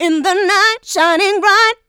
Female Vocals; Houseworx

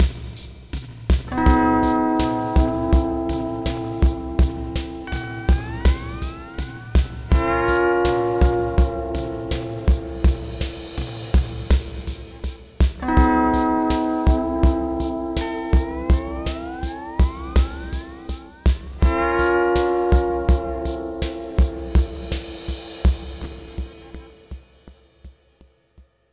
Sunrise Full E, Lounge; Zero-G Kocktail Kollection; Construction Kitz (Lounge); Sunrise Mixes 082

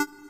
TECHNO PEGG, BASSES; Pro-Rec - Synthsonic

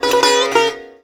SITAR GRV, World Colours; Best Service

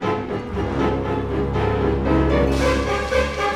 Orchestral Loops

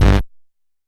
Kick Hi, Stylophone Beatbox

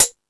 High Hat, Drum Machines

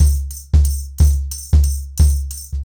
Nuclear 90BPM, ACOUSTIC DRUMS

